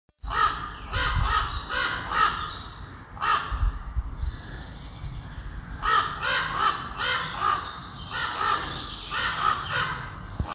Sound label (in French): Grand Corbeau Chant